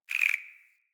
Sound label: Amb Corrupted Critter Close; Ambience; Mendicant Area